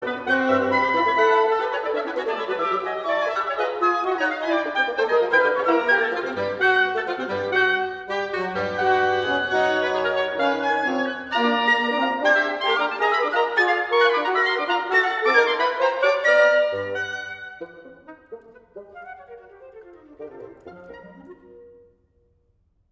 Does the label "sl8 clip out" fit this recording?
No